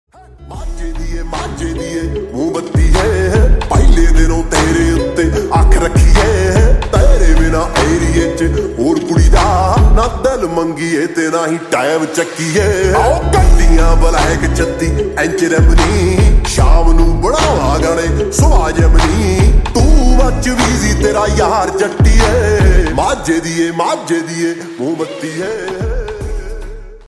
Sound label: Slowed Reverb Ringtone